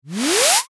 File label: sfx tech scan; horror sf